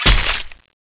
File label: dragontail hit slash; weapons; cstrike